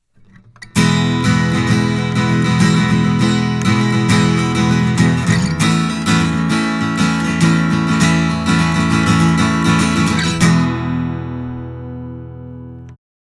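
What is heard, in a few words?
Steel string High Z Odd; Dave Hill Designs Europa 1; KM 184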